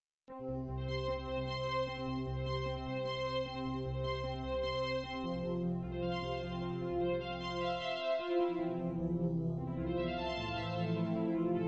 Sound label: electro symphonic waves; sequencer mix with nearly all "Soundblaster" instruments featuring voices of european frogs and toads